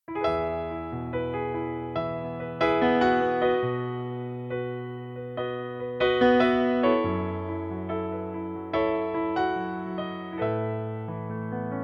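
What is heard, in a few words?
piano playback E major; E-Dur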